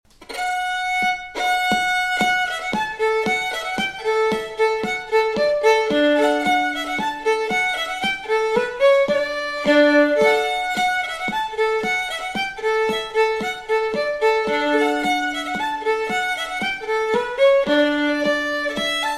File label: polka